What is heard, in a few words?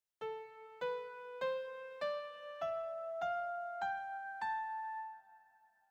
A Min Scale